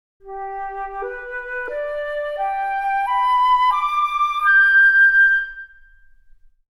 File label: test flute